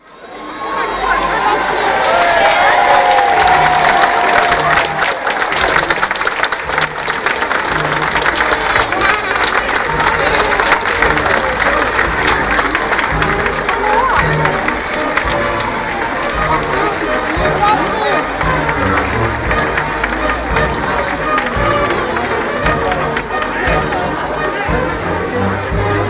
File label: selkirk pickin night; uksm